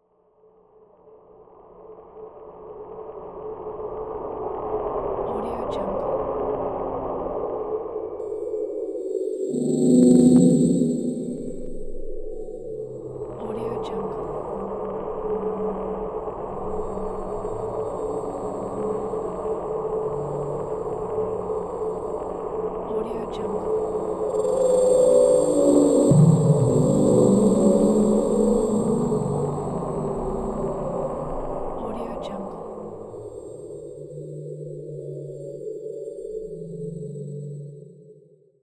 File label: ambiance noise futuristic scifi